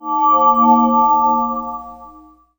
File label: Ele Call; moul-assets